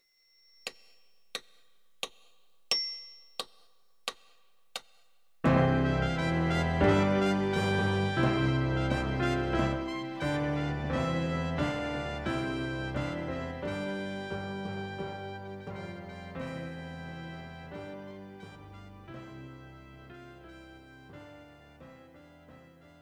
trompetenstueck

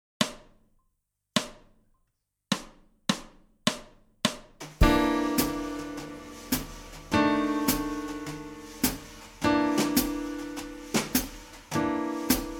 blues in allen tonarten

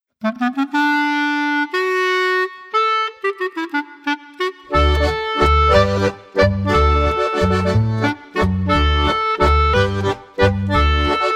laendler playback

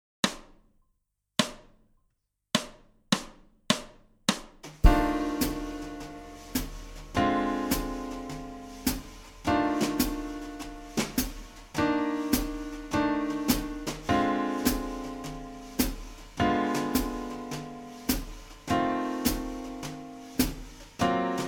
blues mit halbtaktigen wechseln

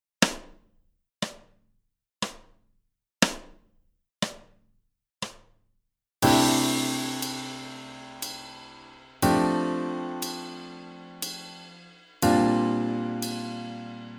dur dreiklaenge im quartenzirkel

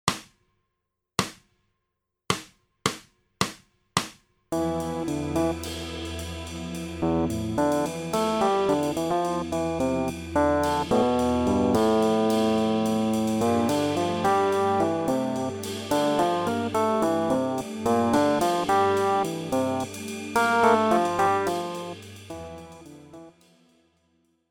modal improvisieren

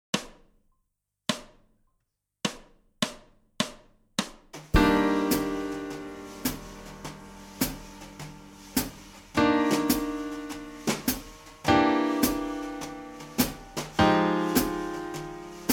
moll blues in allen tonarten

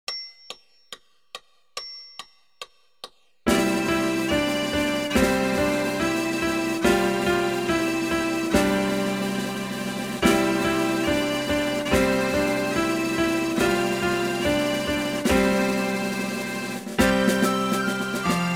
der dudelsack

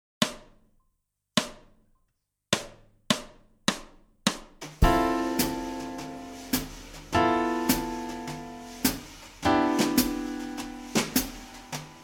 ii v i in dur

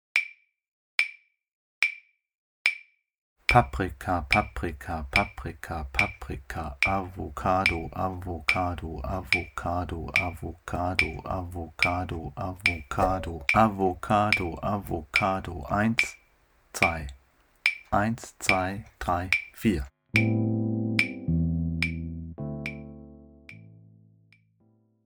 die wandernde bossa nova clave

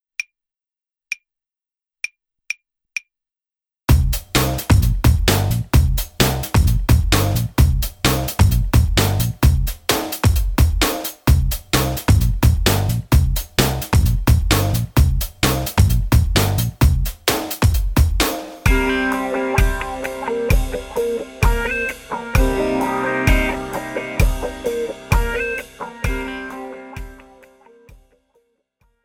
rap playback

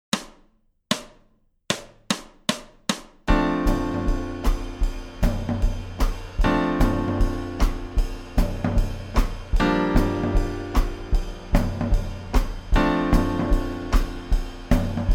rudimentaerer blues

